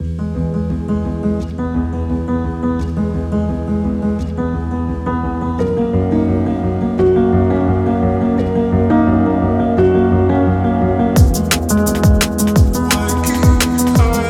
virtual pianist score dark

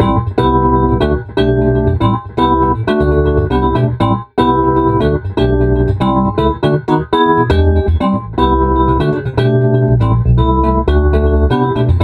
electric dyno fm dyno; electricdyno mkv 2.0

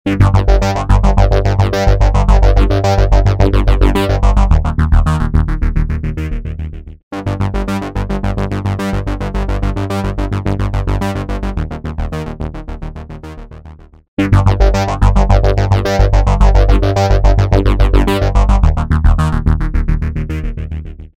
instant phaser mk ii

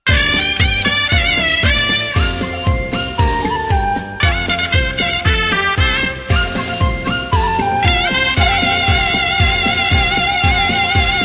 suona

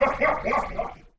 heavy hard phase male man laug

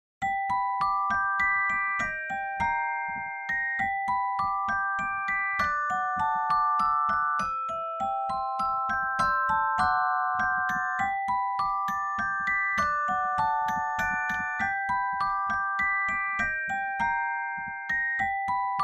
celestette dry; franks

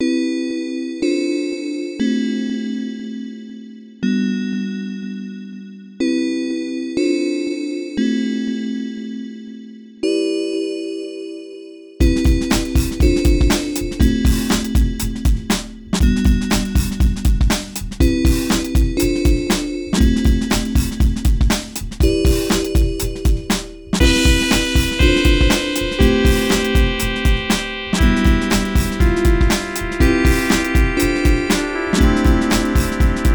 phase loop

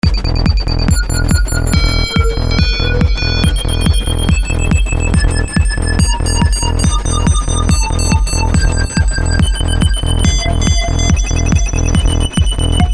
techno

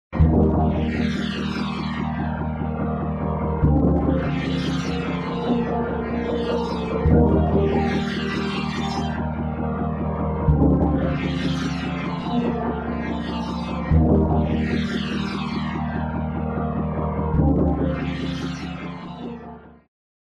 bubbly phaser synth wet

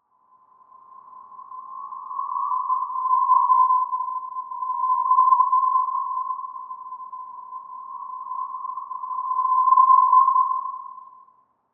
haunting background feedback

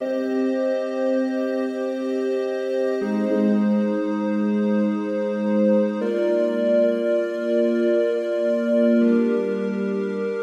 pad soundscape texture

